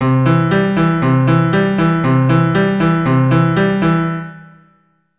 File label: C Arp pattern